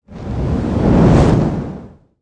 Fireball Pass By Slow, Close up fire whoosh sound from a large fireball, torch or flame swipe